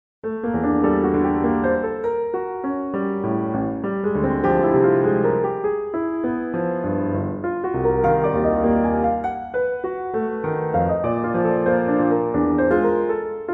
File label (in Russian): Fortepiano